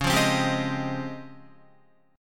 Db Major Sharp 11th, Guitar; strummed

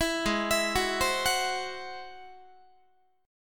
G sharp Augmented 7th G sharp Guitar; G#+7; arpeggiated

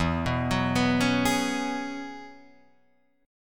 E 6th Add 9th E down Guitar Drop D

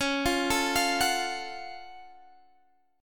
Gb Minor Major 7th Db

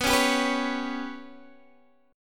B Suspended 2nd Sharp 5th B, Guitar, Open D

